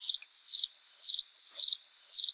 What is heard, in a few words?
cricket; gmod; garrysmod; airfield